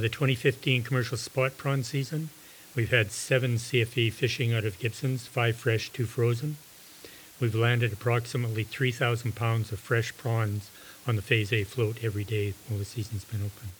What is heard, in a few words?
Rhodes